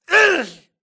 grunt death quick; Titanfall 2

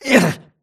grunt hits; Titanfall 2

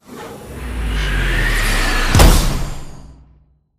embark casual front end; Titanfall 2

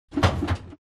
atlas rattle int; Titanfall 2

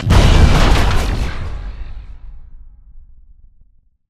spectre overload; Titanfall 2

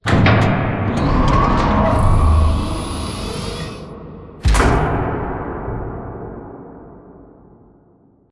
Giant Floor Panel Assemble Rotate Mech; Boomtown; Sideways Town; Titanfall 2

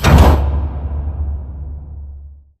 pod door close start; Titanfall 2

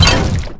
imp bullet lightballistic bubbleshield

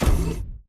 footstep sprint close; Titanfall 2

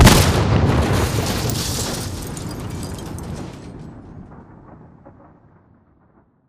Explo Close; AI Reaper; Titanfall 2

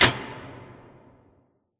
Giant Pillar Pods Start; Titanfall 2; Boomtown

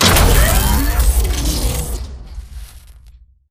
HUD Titan Battery Pickup Charge; Titanfall 2

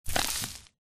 imp bullet lightballistic dirt debris

